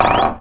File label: Fx Window Shutter; the princess and the frog; Diner; Light Buford